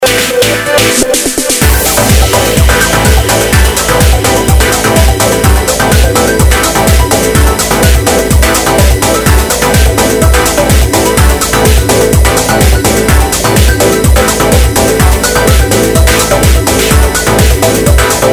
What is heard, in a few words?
Electrico new wave